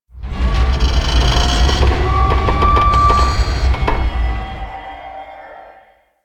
Asteroid Big Ship Groans; Ambience; Warframe; Grineer Asteroid

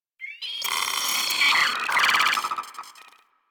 Arachnoid Micro Vocal Idle; Warframe; Corpus

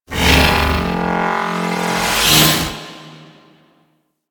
Mag Idle Agile Power; Warframe

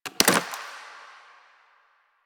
Phone Hang Up; Warframe; Ambience; Nineteen Ninety Nine

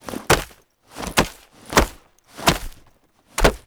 Grabs Helmet; Teshin; Warframe; The New War; Throne Room

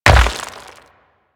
Rock Elemental Footstep Default; Warframe; Brawler

